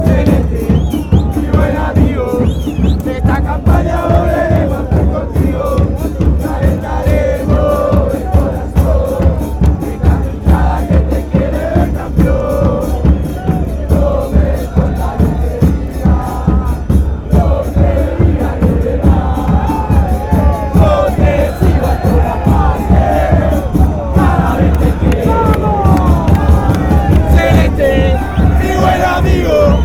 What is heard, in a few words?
atletico rafaela chant